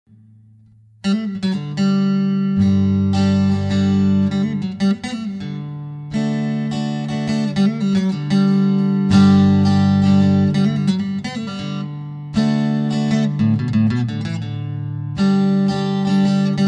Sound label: acoustic